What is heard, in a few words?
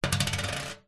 foley money coin set down metal table; zapsplat